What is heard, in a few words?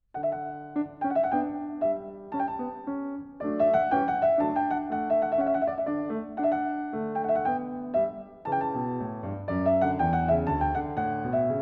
Partita in D-dur - Menuet, Keyboard; Partita No.4; BWV 828